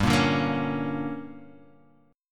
D Major 7th Suspended 4th Sharp 5th, chord

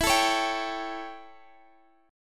A 7th E, chord

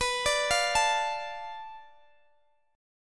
B Minor 7th Flat 5th B Guitar DADGAD

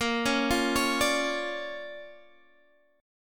D Minor Major 7th Sharp 5th Bb, guitar

